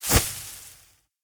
Multishot End; We Are the Dwarves

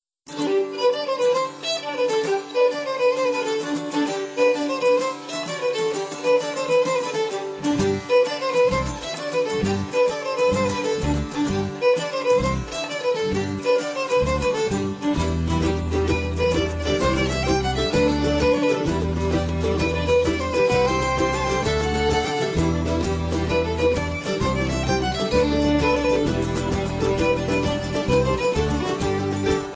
BGM Celtic; Shop Empire 3

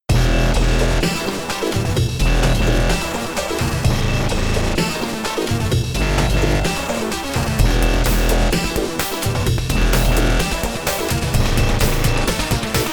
Superdrum; trials fusion

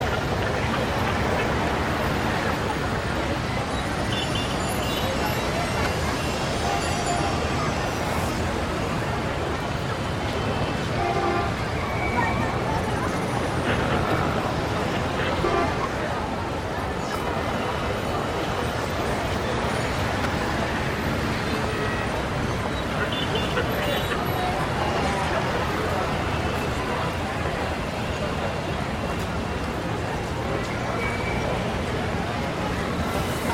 City Modern Large; Civilization V

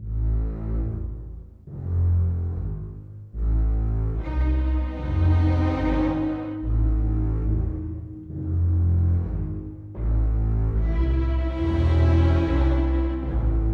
Music Ambient Long; MajorProblem

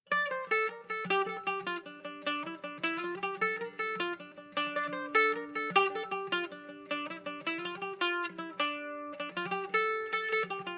Tavern; Assassin's Creed Unity

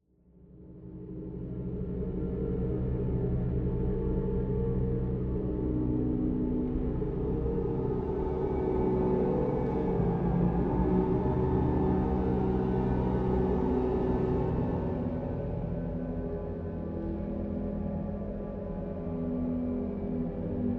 Tension Block

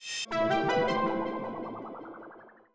pickup new level; Space Otter Charlie

